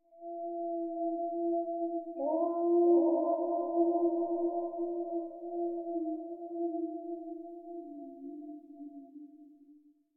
Wolf Howl Eerie With Delay; Hollywood Edge; sound designer's toolkit v2

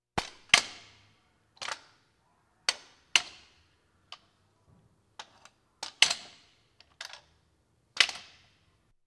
Police, Fire engines

